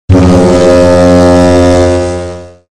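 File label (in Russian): звук цензуры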